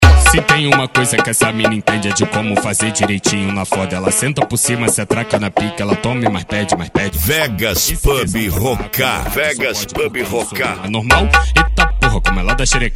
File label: funk mega bass